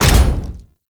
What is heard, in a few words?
Jump Landing; Warframe; Corpus; Arachnoid Hunger; Steps